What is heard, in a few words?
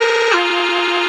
vocal shake Fm; SD AURORA Sample Pack; Slate